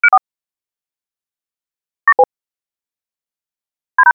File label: Media Blocked Bleeps